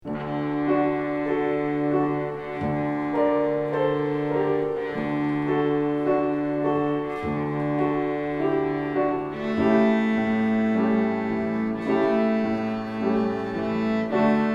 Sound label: Tone Piece, cello